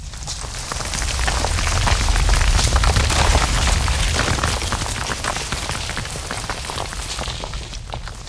rock rumble short

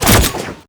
plasma regular fire, weapons

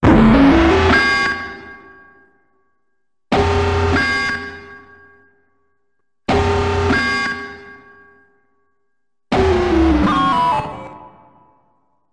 alarm

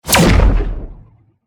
shotgun; voidling

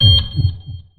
timer idle loop

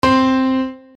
piano rockets ding

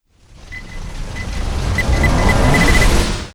exploder big windup

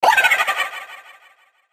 jackolantern laugh small; mission folder that appears when you have taken too long in a ghost house

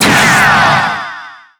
teleporter send

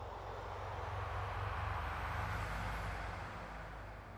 spooky ambient sound

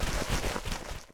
zombie digging out

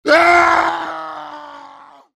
heavy scream

